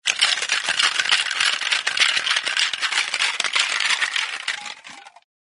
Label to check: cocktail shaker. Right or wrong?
Right